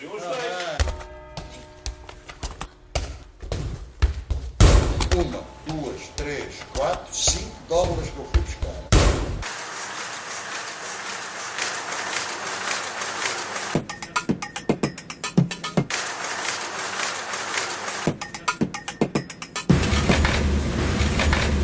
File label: orchestra; Lisboa